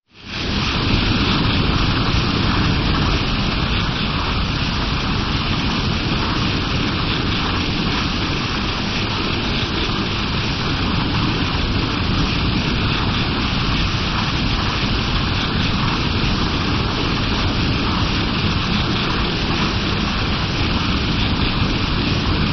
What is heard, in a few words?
thrust